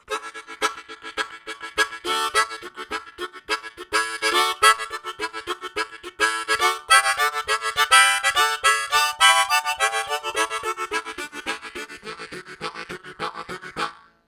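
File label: Medium Chug Falling, Harmonica Riffs; Zero G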